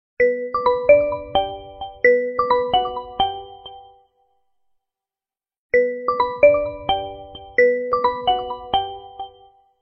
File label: Realme 8 Pro 5G Original